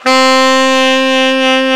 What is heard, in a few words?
TENOR SAX; Alpine Volksmusik